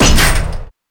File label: Door